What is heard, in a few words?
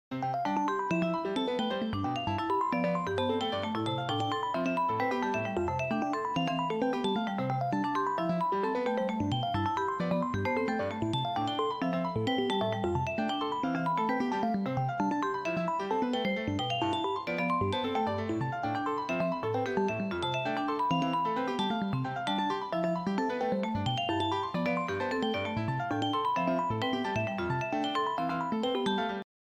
harmony example